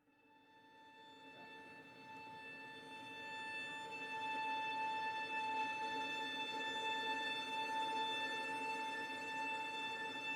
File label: Shimmering Lights String Drone; Deep Nordic Drones